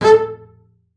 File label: MARC A4, BASS SPICC; Xsample VOL-5